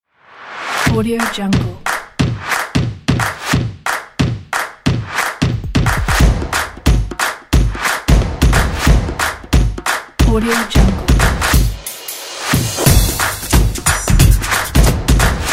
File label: happy drums